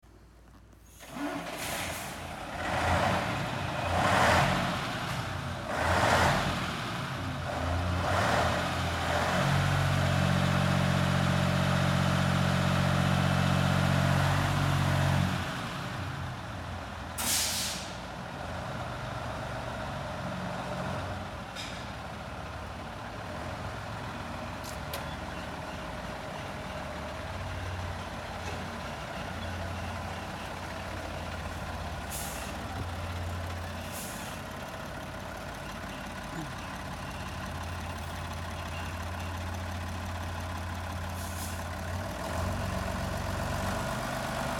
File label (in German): Motorsound